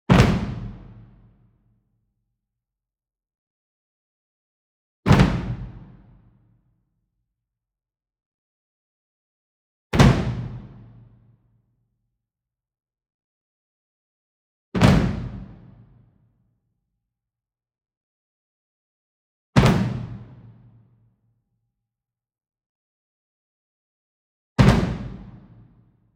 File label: ENSEMBLE DRUM Bass Drum Marching Flam Medium; boom library; Cinematic Strikes Construction Kit